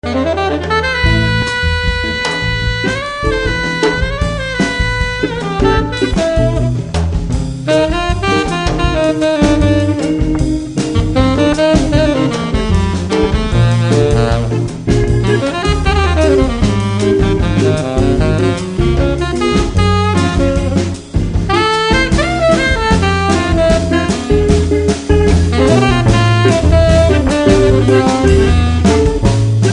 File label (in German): sax